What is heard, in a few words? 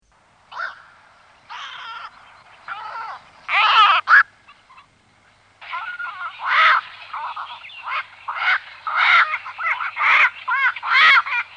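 Night heron; Madarak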